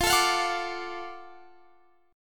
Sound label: F sharp Minor Major 7th Sharp 5th